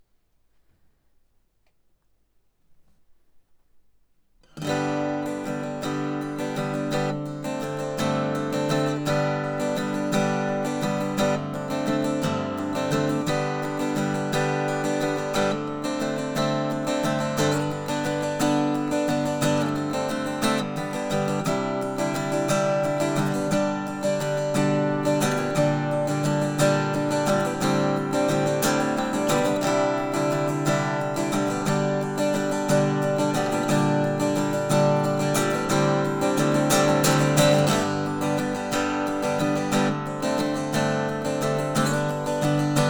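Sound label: Acoustic Guitar Stroke Bridge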